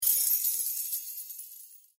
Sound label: coin shower end; Fluffy Arcade: Doubleup Ducks LuckyTap; Eyecon